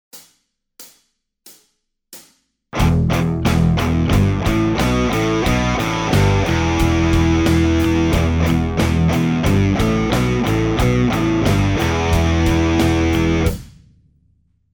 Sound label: alternate picking riff